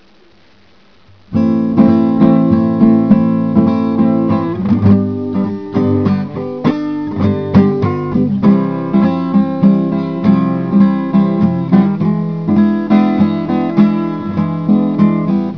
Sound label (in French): INCAS, guitare